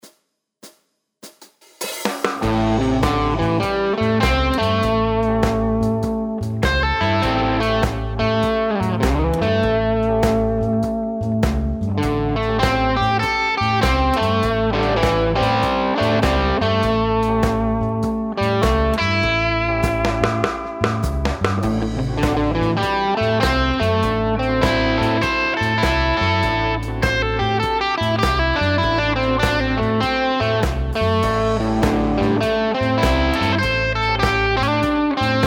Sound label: Solo